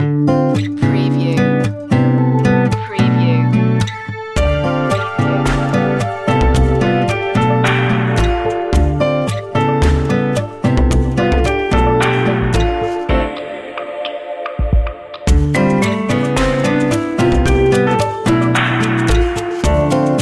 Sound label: lounge water